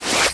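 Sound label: spider hit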